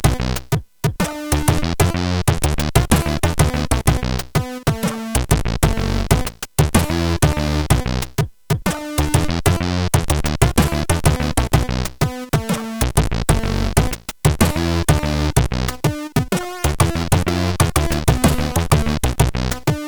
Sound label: Game Intro, CSG8580R5